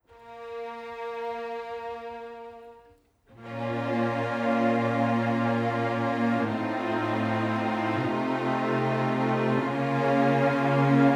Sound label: Orchestral Overdub